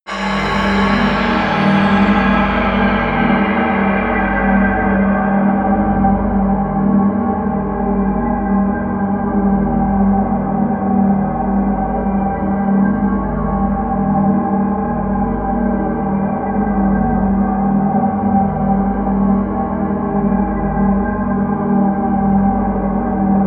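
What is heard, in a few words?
dark place, pads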